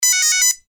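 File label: mobile phone signal; handy signal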